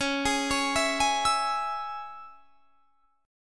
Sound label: C sharp Minor, guitar chord; C#m arpeggiated